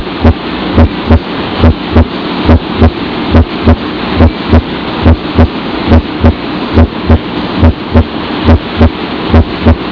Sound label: Heart Normal Split S1 Tricuspid